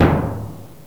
Gran Cassa, Drums; GMPlay; TG300B

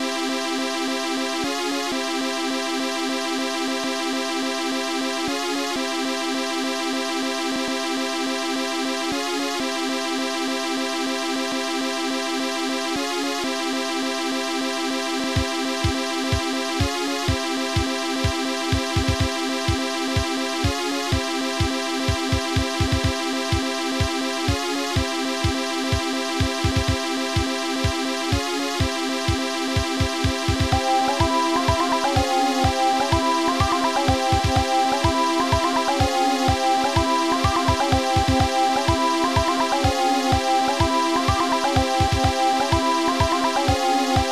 Musica Araba; Avventura2

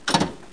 rullo

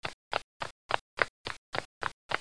Passi veloci; Omnia Junior - Base Terra

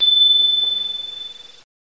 campanellino; Omnia Junior - Base Terra; Neve

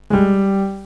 acciacca

